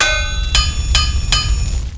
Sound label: Ui Chage; Knight Online